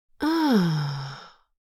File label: Relaxed female vocalization